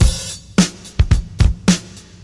107 bpm drum groove b key, Free drum beat - kick tuned to the B note. Loudest frequency: 1460Hz